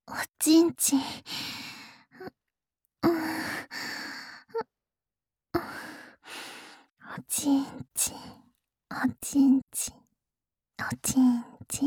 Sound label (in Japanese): しっとり喘ぎ; オナサポパート